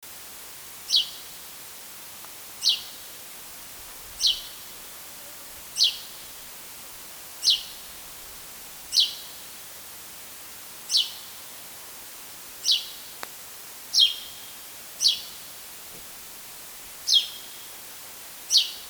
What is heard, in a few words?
Spanish Sparrow